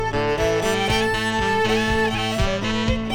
sax

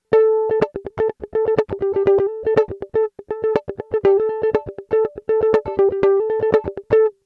mandolin comparison electric high single in

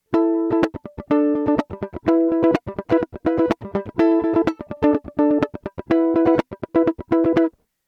mandolin comparison electric chords srml

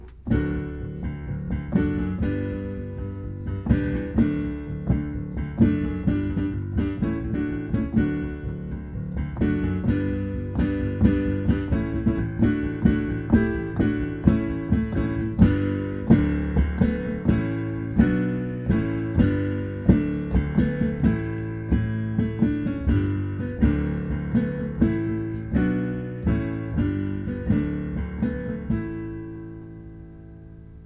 rocking octaves with simpler chords

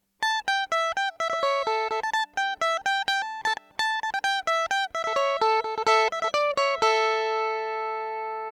mandolin comparison electric di

